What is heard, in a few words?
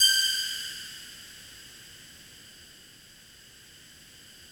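FOG ATTACK G5 Left, Yamaha VP-1; Music Store; 90's Sample CDs; FOGATTACK 3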